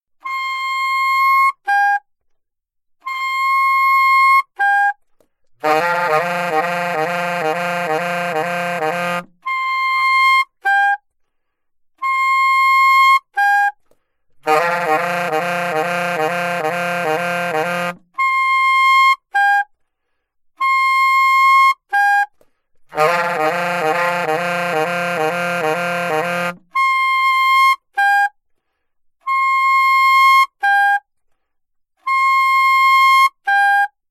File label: altosax